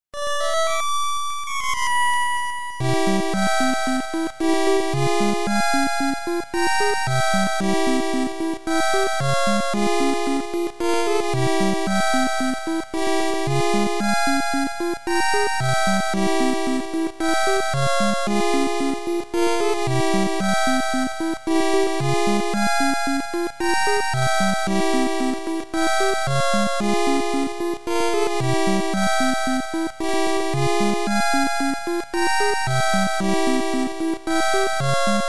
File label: warp whistle; Super Mario Bros. 3